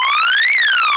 loop

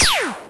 laser, weapons

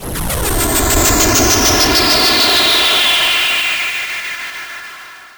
depressurizing

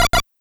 alarm loop

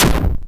hard, explosions

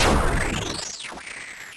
cannon, weapons

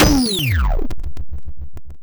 shutdown

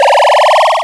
powerup

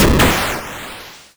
double, explosions

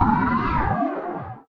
screams alien

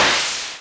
soft, explosions

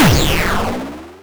odd, explosions

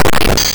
screams robot

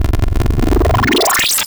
portal; movement

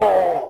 screams human

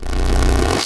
mechanical noise

